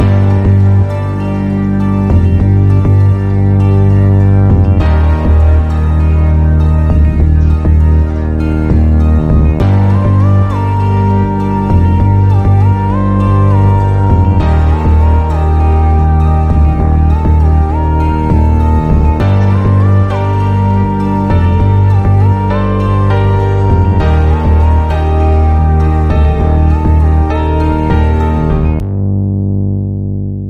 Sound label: Coming Of Age, No Percussion